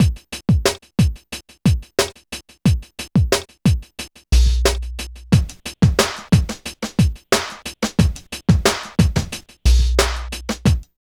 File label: LOOP; East West; Phat & Phunky